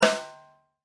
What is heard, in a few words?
rim snare